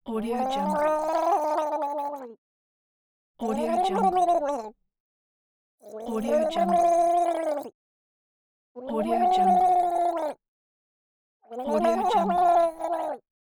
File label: gargling